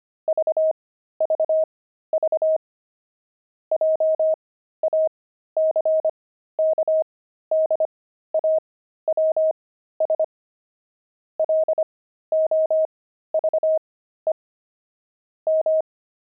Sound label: pangram, intermediate pangrams files; jackdaws